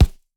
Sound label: Punching Percussion, Straight Glove, raw